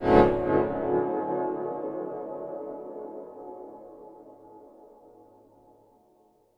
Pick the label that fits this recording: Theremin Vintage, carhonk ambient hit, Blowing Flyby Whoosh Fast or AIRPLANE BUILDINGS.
carhonk ambient hit